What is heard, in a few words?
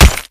bullet light ballistic concrete close; Titanfall 2